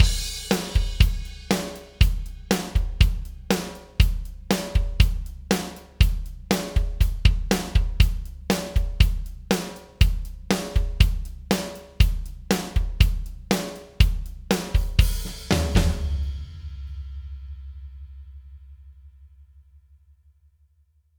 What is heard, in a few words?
Mersey beat